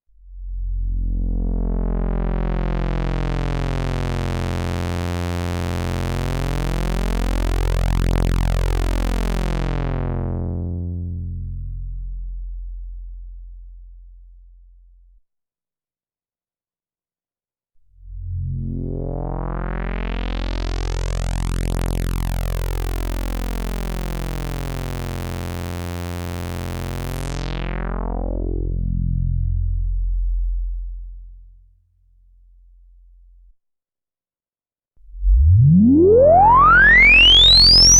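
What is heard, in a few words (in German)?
Filter 1 Sweep mit zunehmender Resonanz, synthesizer; Sequential Pro 3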